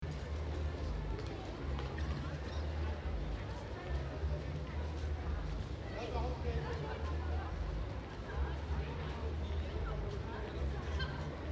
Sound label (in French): terrasse belvedere du complexe commercial; block one